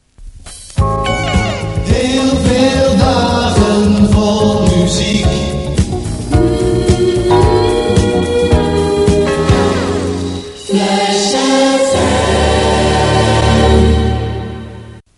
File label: jingle; Flash